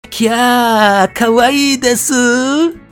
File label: kyaa